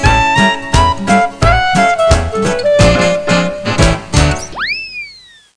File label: end mus; Sexy Games 3